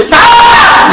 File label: scream loud; sheldon